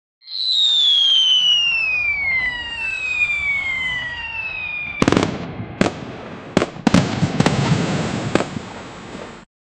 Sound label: create a sound of firewor, carnival